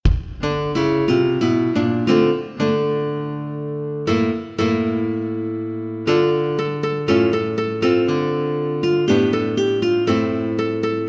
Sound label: synth